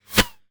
bullet flyby fast; Bullet Impact; Gamemaster Audio; Bullet Impact Sounds

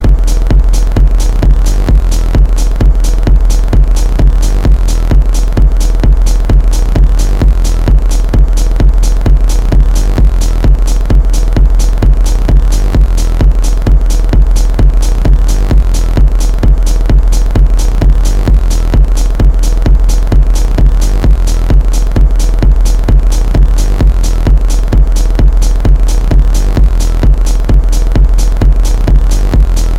New Beat

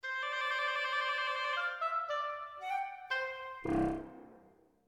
surprised

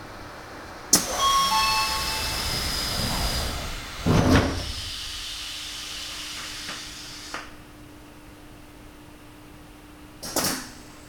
chime close; Odakyu 3611

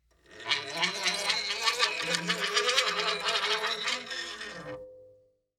ZITHER Coin Scrapes U87; Twisted Zither

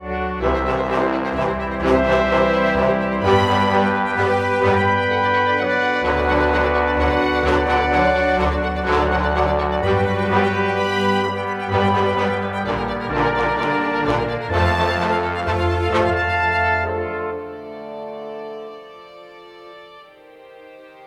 orchestra; Mobile Moto immoto

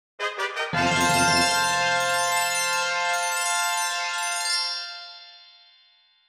Fanfare mystery

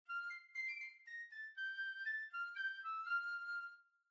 Melody Cheerful

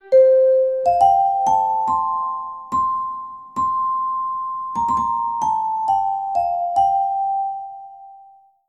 Sleep

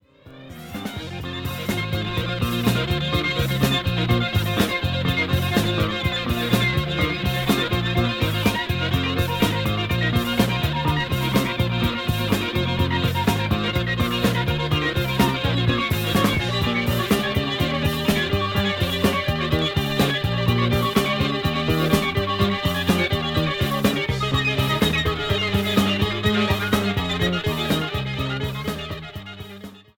Trap

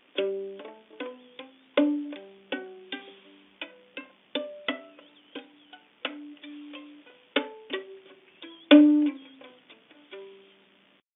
Pizz; paganini

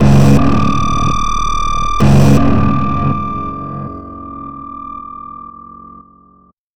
suspense

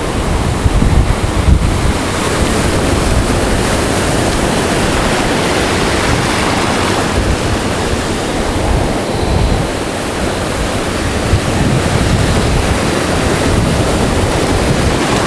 Myrtlebeach waves

